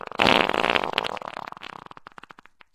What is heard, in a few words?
fart long bursting; Farts